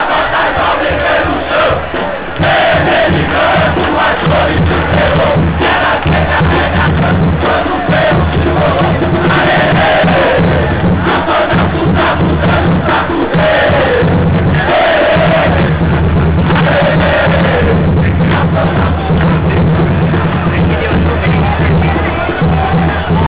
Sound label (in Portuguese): gritos